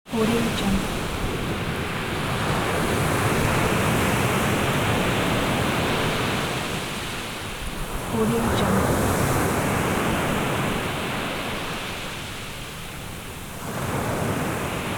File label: sea waves sound